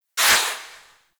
Full Draw Tail, Weapons; Warframe; Infested; Cernos

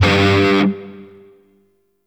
Shawzin Oct One G; Warframe; Grineer Shawzin

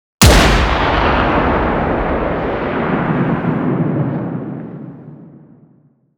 Fall Down Gunshot; Warframe; NineteenNinetyNine; Stage12PartyOfALifetime

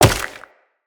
Wall Jump Ice; Footsteps; Wall Run; Characters; Tenno

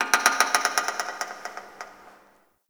Metal Creak Small, Ambience; Warframe; The New War; Zariman

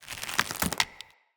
Mockery Collapse, Foley; Enemy; Mockeries; Characters

